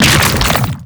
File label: Horrors Arm Spawn; Warframe; ManInTheWall; Octopede